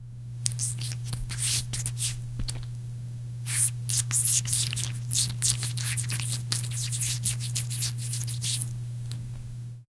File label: realistic sound of medica, gel